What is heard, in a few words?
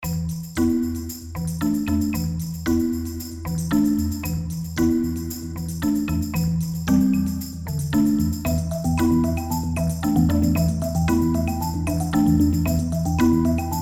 Comedy Pop